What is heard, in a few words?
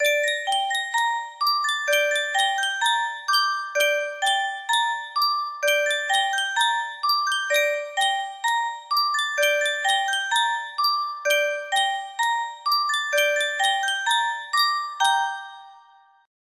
Yunsheng, melody